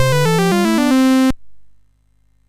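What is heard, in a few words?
Number Tumbler, MOS 6581 SID chip music, games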